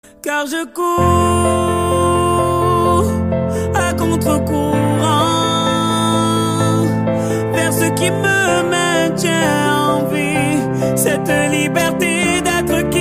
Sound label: Forrest Soprano